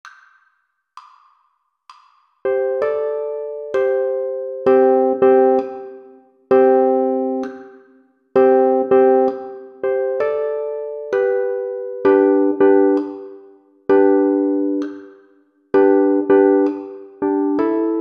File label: 65bpm, uke